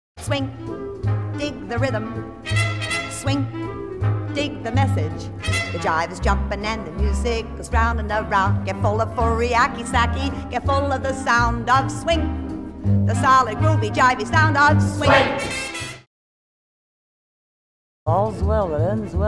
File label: Swing